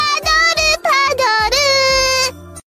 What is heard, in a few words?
padoru padoru